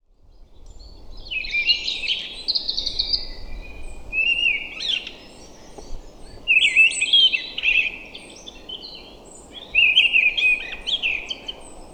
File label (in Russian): deryaba turdus viscivorus; peniya ptits